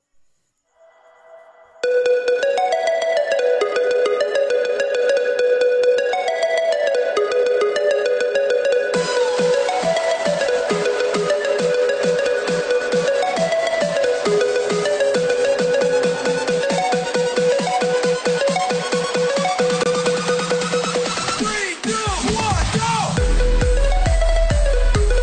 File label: bgm ganpati bappa call ringtone ganesh chaturthi call ringtone